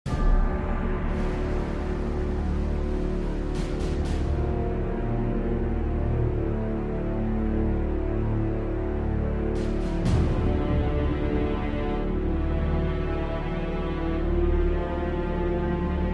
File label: Ambient Mission; Iron Man; DS; PS3; PSP; Wii; Xbox